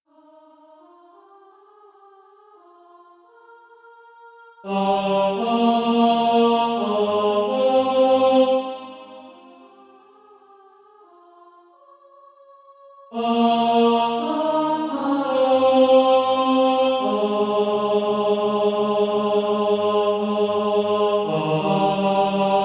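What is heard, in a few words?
tenor, Practise Music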